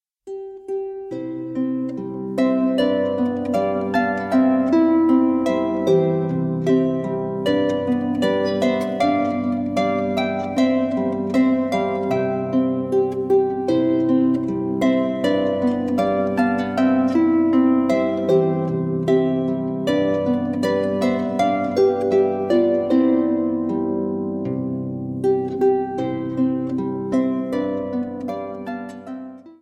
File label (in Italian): Andante